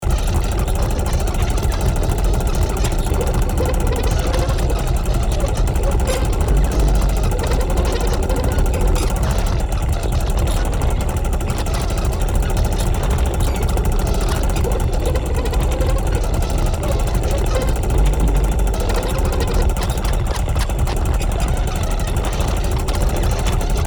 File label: Unstable rotating vintage mechanism loop